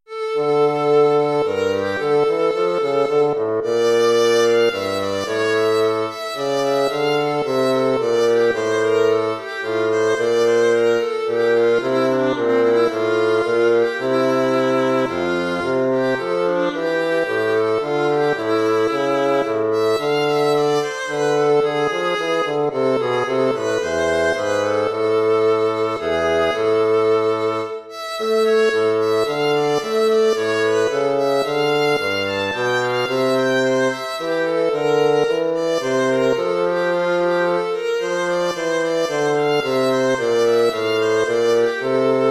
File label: courante